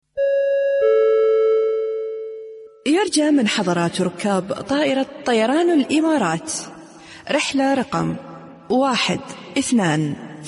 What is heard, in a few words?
airport ringtone